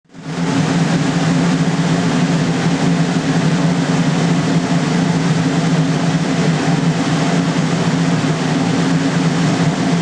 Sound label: air hum